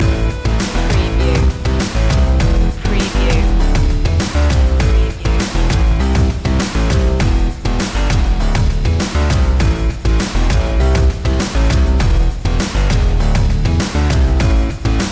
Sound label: electro slam loop